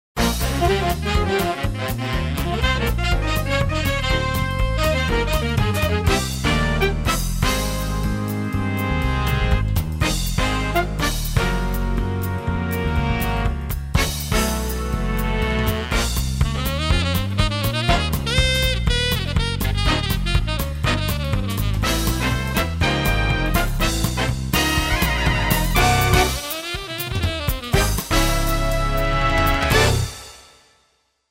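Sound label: music, Adult Contemporary Jazzy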